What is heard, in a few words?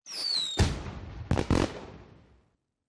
firework; Microsoft Solitaire Collection